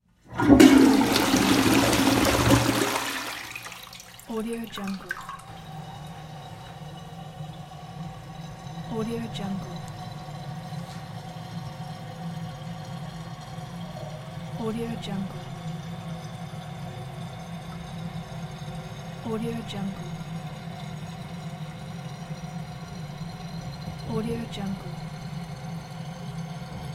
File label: flushing the toilet